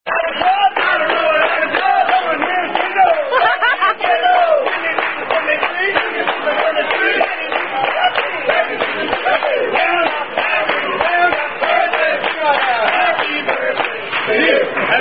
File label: Singing Happy Birthday; Red Robin Happy Birthday; Sound Off (The Duckworth Chant)